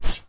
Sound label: sword